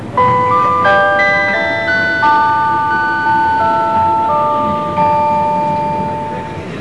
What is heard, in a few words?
chime; 12系客車のチャイム